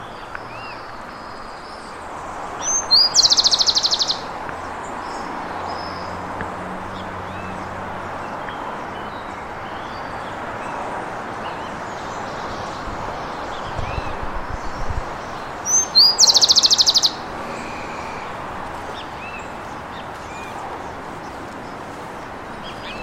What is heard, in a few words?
Zonotrichia capensis